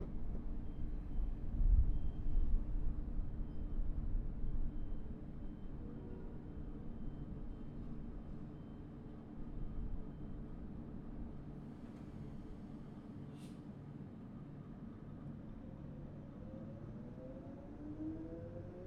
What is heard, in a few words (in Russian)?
poezd pokidaet stanciyu brisben avstraliya